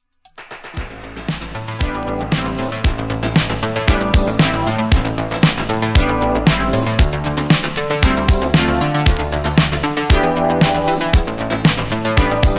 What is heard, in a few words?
Instrumental